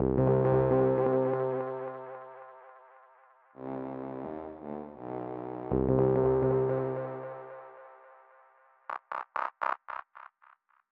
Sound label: Swamp Intro